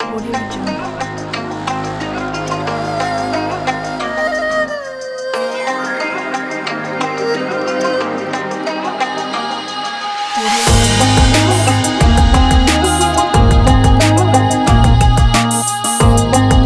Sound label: chinese new year hiphop intro